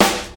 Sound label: Short Acoustic Snare G Key, snare drum tuned to the G note; snare drum samples